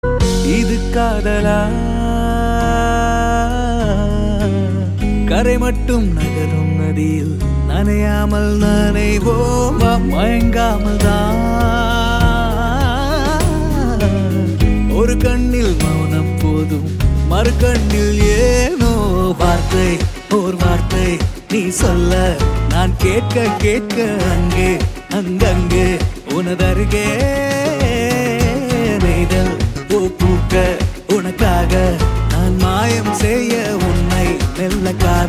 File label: Love Male Ringtone